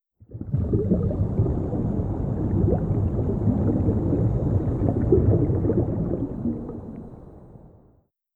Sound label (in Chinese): 流水音效; ambience stereo